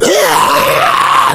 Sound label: rage at victim, infected, male